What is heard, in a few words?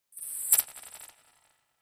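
HF Electronic Whip Reverb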